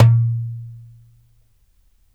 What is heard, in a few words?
DARABKA, Mediteranean Traditions, PERCS